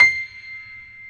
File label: Piano C6; Proteus 2000; Digital Sound Factory Proteus 2000